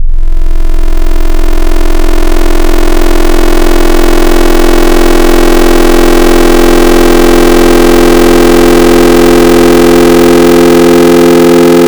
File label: Eight Harmonics, FM Wavetables; Cymatics; Virus Wavetables; Serum